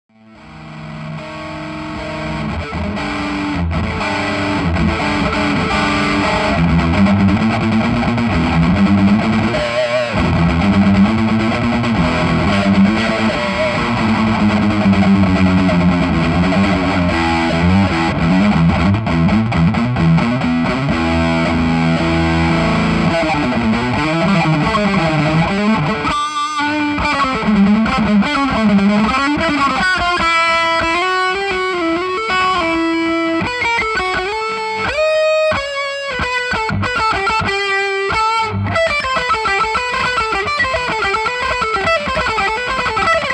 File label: tone; big soco pi